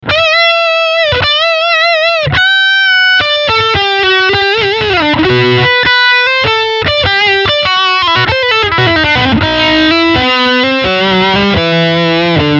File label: Overdrivechannel Leadsound